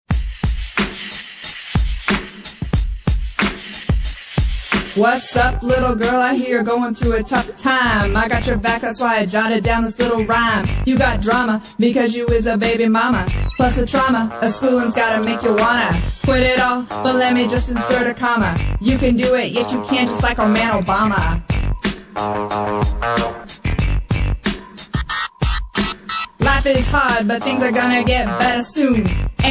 rapping stop trippin

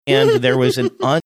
giggling

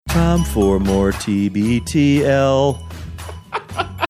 singing time for more

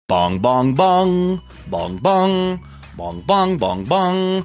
singing the theme

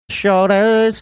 singing

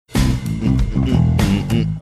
singing along funky bass; tim heideckers

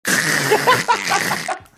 attempting recreate throat sound

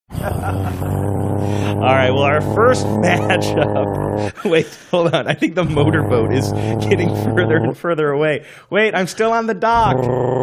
drum roll sound motorboat

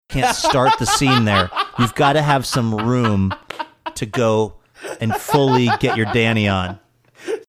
laughing comment about impression

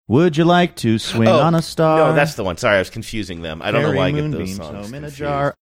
singing

